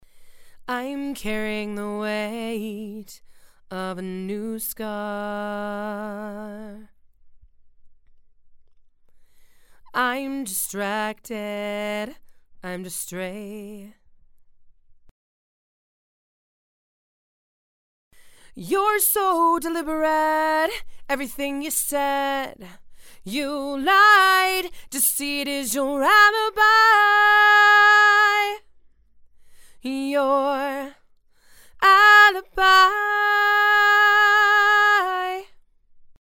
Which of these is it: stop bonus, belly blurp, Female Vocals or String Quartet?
Female Vocals